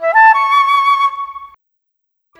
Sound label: Flute, Orchestral Series Rock and Pop; Sonic Foundry (Sony Creative Software); Orchestral Series VOL-4 - Rock and Pop